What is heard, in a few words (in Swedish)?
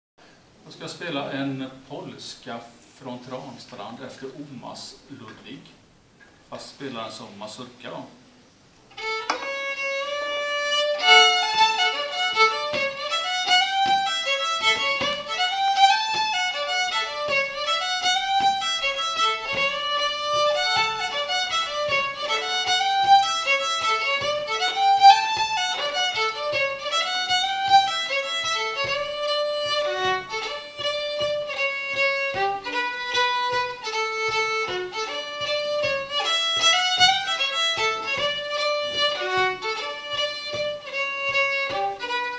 Polska (Mazurka); Polska (Mazurka) efter Omas Ludvig